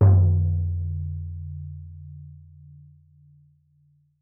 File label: Buffalo Drum - A#; Percussion; Cymatics - Infinity Beta Pack 2.0; Zeus Percussion Collection